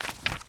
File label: Pickup Note